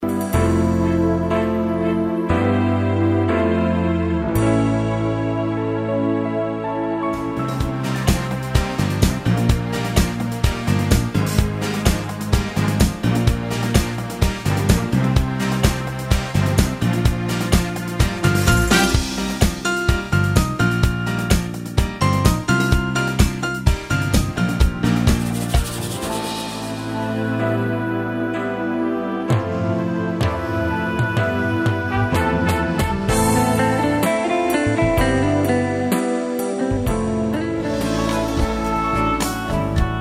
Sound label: Pop